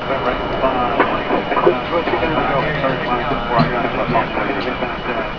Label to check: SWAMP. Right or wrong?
Right